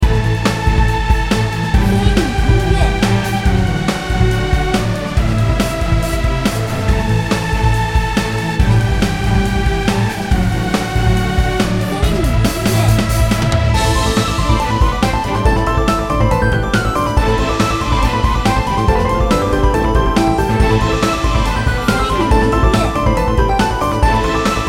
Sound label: battle